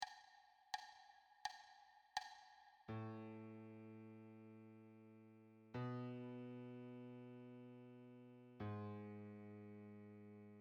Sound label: wholenotes solo